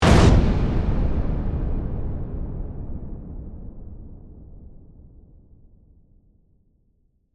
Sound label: Hit Burst Dark, Shatter, Debris, Short; Production Element, Imaging Element, Accent, Transition